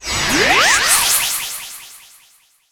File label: Enemy Poison wizard Apple Absorb